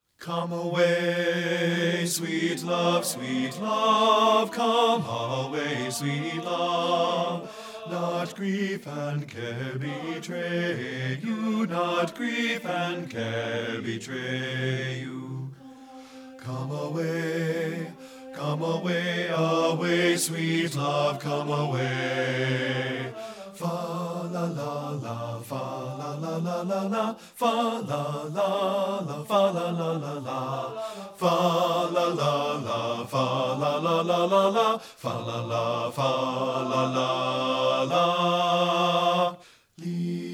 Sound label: COME AWAY SWEET LOVE BASS; men; partbypart